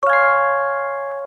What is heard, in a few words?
success; adventure core